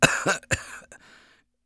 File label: cough, English Voice; The Sum of All Fears